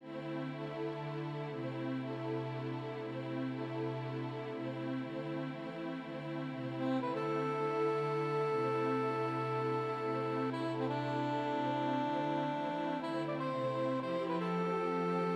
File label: Soundscape sax quartet